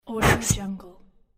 inflate

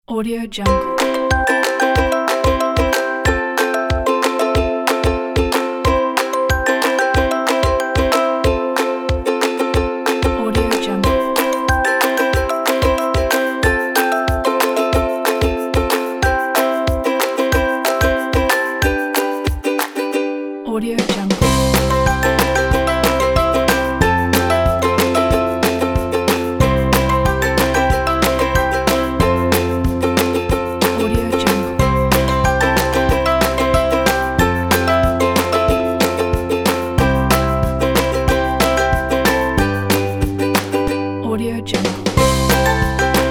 happy ukulele folk

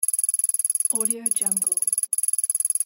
computer digital text; art of sound

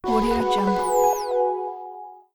corporate logo

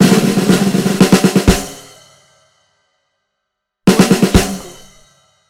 marching drums

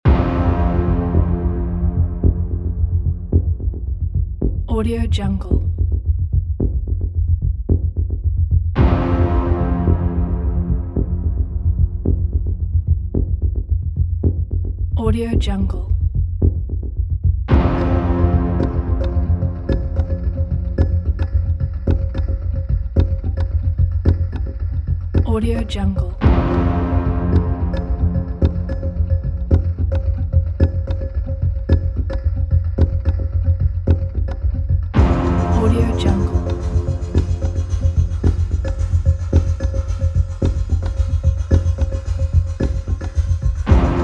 tension action

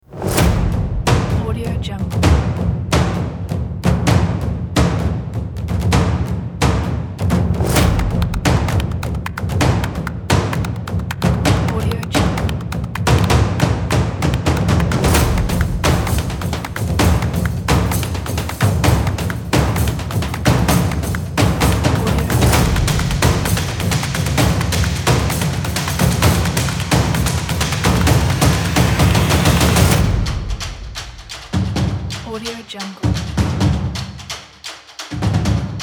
epic sport drums

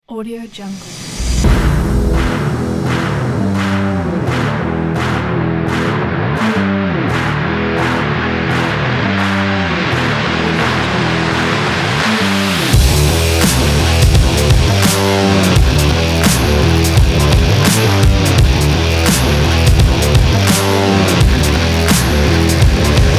sport hiphop rock

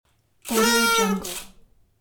party horn

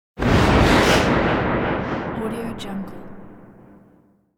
impact fx

